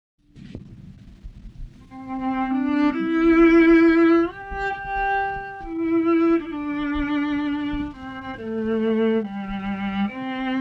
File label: viola denoiser